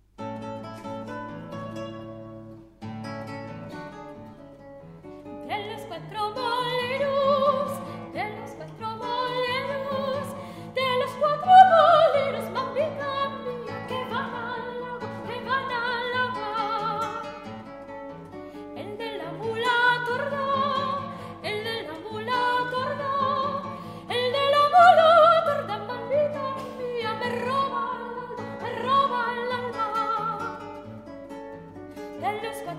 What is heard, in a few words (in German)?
Gitarre Konzert; De los 4 Muleros; Heroldsberg